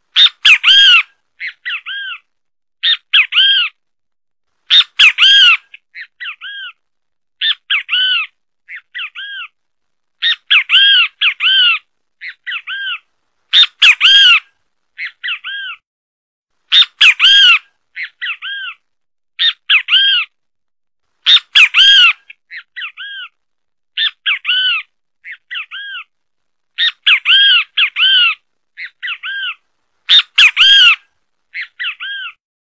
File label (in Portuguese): Canto do Bem te vi